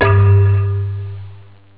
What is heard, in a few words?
mallet; jazz jackrabbit 2; M8